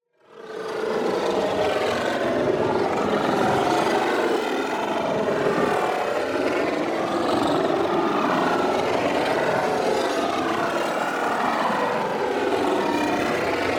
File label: PARTICLES Matrix Moan; boom library; Matter